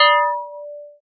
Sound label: bell synth; Max